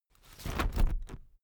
umbrella telescopic put up

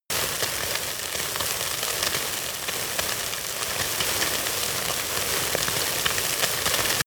fire object small burn

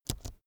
keyboard laptop space press slow soft

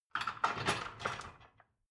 dishwasher cutlery basket rattle

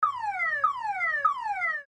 car alarm

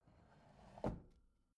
bathroom drawer open soft

